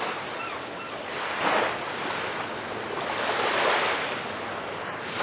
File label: beach world waves